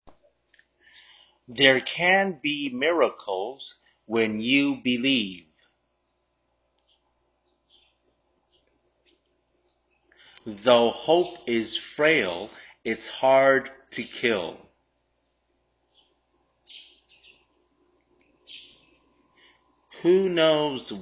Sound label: chorus